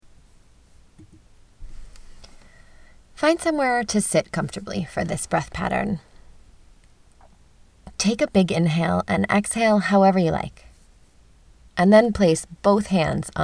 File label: Heartbeat breath